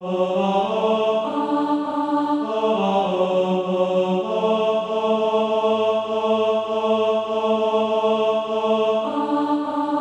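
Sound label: tenor, Practice Music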